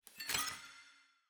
ambience room witch lodge spell ready

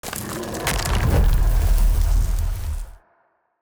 Fire Elemental Death; TANK; 3Souls

